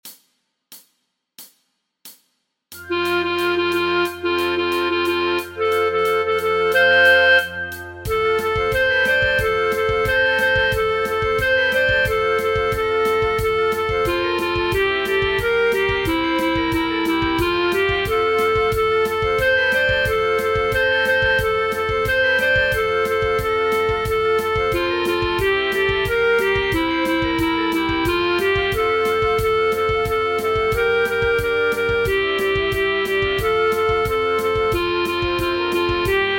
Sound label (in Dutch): voor oefentracks fluit